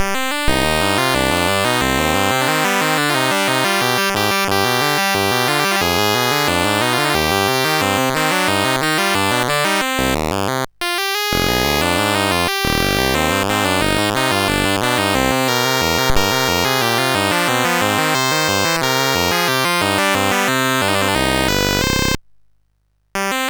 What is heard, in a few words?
sid MOS6581R2; Cave of Death; hvsc